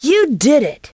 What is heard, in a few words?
Correct